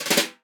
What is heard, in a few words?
brush; Northstar - New Gold VOL-2; 50S BRUSH